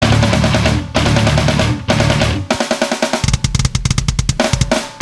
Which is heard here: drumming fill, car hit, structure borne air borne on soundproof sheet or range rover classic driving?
drumming fill